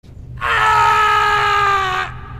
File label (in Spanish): grito